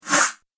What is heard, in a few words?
Whoosh; Film Fx